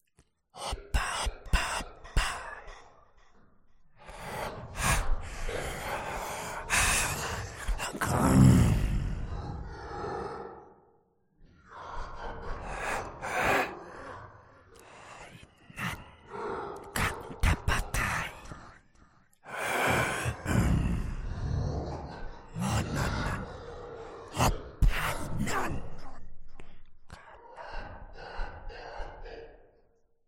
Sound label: soundscapes